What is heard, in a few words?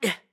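Hurt, voice; Link; BOTW; Breath of the Wild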